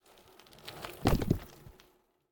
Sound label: Stone Cut; MiSide